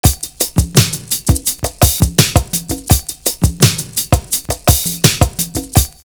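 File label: percussion, loop; CURTIS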